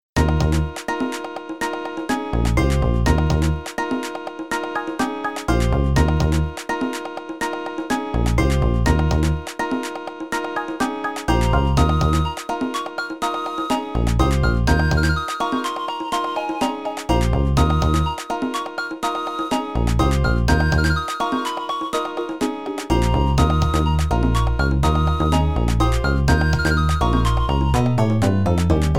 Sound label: beach; PokeRogue